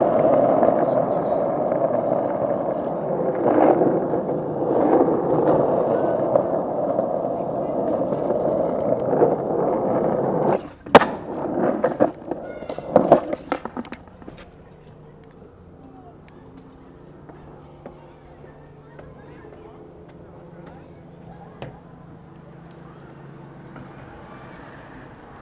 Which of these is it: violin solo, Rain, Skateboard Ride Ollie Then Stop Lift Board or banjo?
Skateboard Ride Ollie Then Stop Lift Board